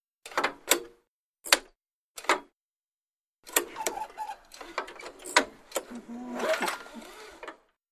Lawn Mower; Start-up Cord; Several Clicks, Clanks, And Yanks From Start-up Cord. Close Perspective. Small Engine.